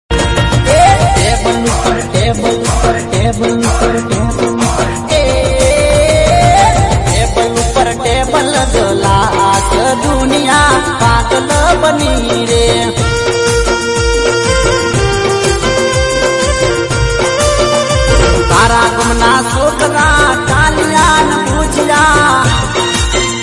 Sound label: Ringtone Gujarati Song